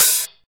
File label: Open HH, Cymbals